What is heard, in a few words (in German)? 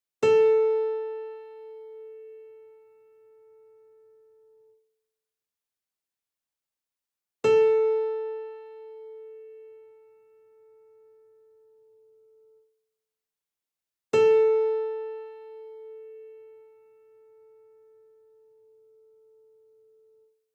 Stimmton a; Streicher Kleeblatt Klavierbegleitung